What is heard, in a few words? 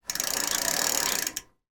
transport bicycle back pedal